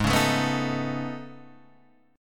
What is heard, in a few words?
A Major Sharp 11th, Ab